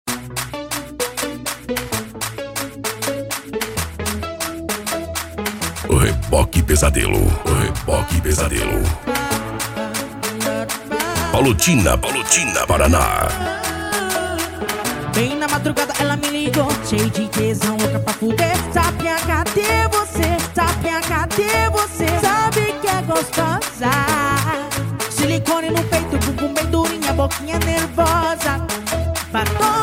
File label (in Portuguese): sound car